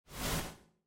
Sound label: spell whoosh; soundscrate